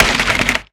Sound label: mined tree; factorio